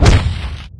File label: strider step